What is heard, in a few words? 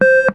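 Laser Fire Interior Empty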